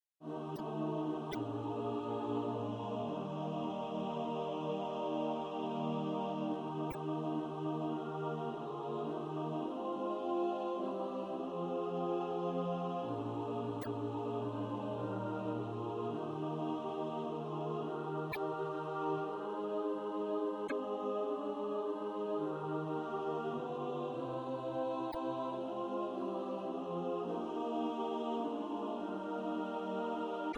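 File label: alto, SATB Practise Music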